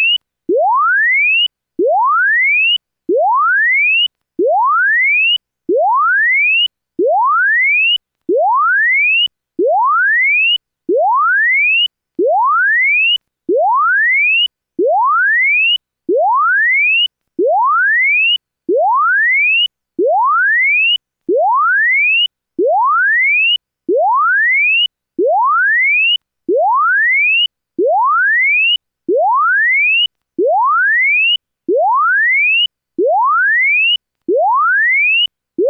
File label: sweep; iarums